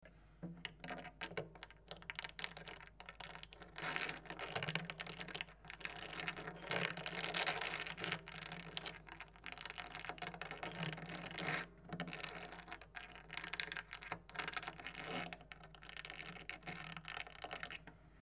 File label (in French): peigne, harpe